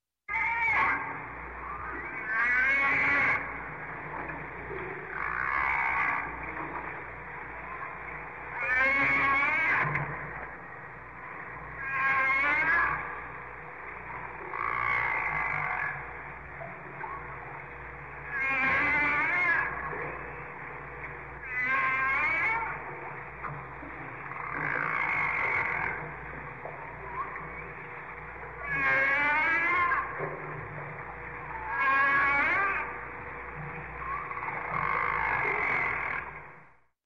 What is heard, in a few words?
muted call of a humpback whale in captivity